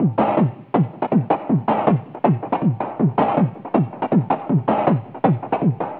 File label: Drumloops, 160bpm; Drum Bass; Zero-G; Total Drum Bass